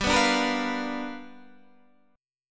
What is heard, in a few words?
G 7th Sharp 9th G, Guitar Standard; G7#9 strummed; JGuitar